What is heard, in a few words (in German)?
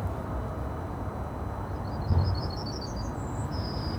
goldammer